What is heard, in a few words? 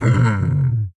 DragonTurtle Death